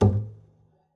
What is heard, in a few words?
pizz noise mute g, double bass